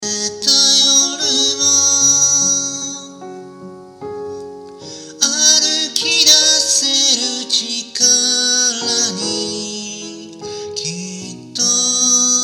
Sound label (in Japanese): 高音域